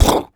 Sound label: Human Beatbox, Beatbox Hits; Loopmasters; Vocal Hits And Kits; Human Beatbox 2